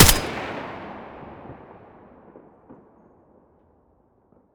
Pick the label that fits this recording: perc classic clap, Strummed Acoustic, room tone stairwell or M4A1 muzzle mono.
M4A1 muzzle mono